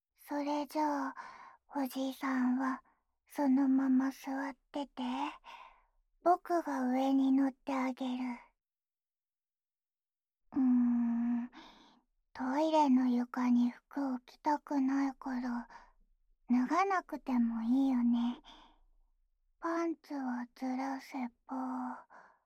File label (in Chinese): 在坐便器上的对面舔耳座位（右耳）